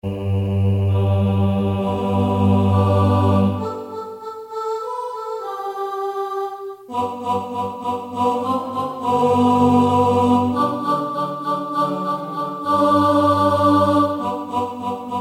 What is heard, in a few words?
ALTO